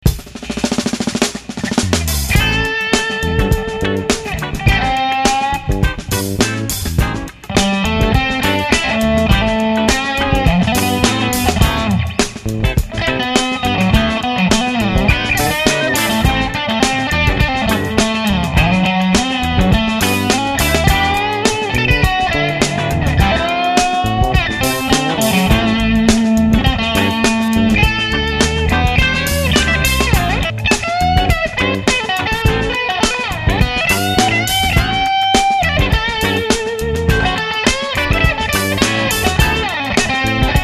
groove

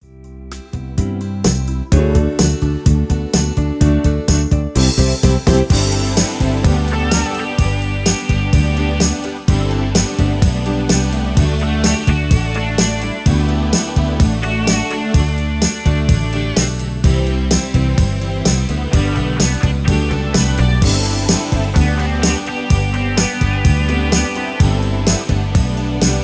country

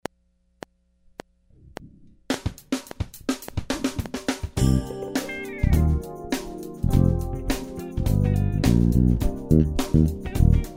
bass groove

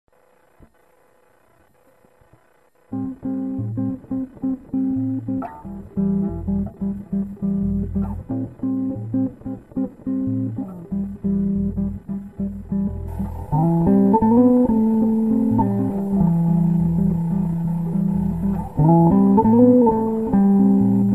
samba bass

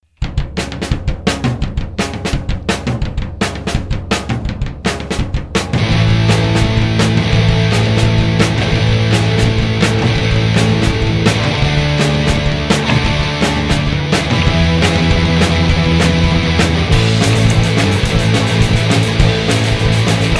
punk rock

